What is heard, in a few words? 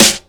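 smooth snare drum sample a key, tuned to the A note; snare-drum-samples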